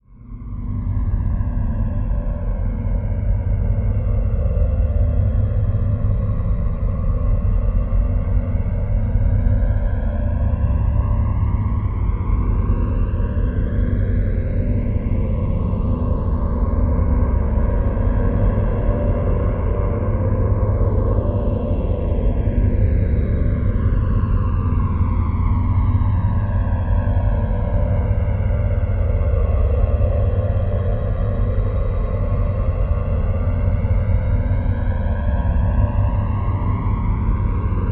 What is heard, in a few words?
Drone Dark Phase Evil Gritty Phase; Production Element Imaging; AdobeCC